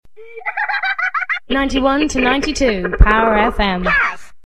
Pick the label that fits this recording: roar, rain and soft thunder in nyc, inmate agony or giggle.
giggle